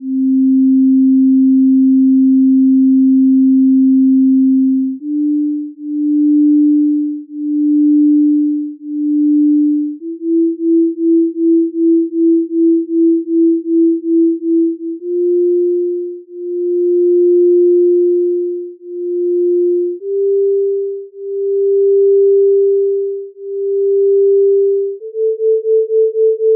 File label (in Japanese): 純正律とのビート音階, 基のみ; 付録D：音階・音律